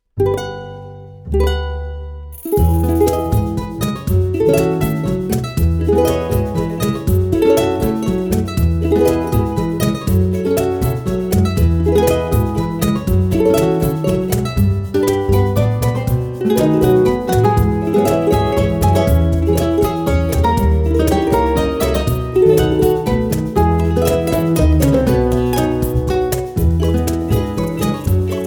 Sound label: UKULELE CAFE; MUSICHE ORIGINALI